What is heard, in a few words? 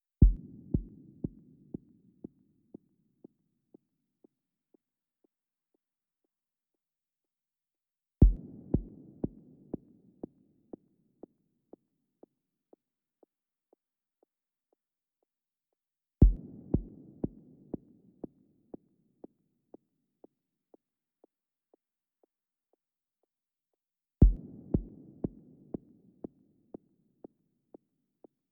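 percussion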